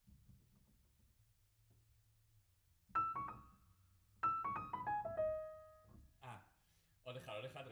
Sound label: Piano